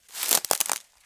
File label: Crunchy Step, outdoor, Footsteps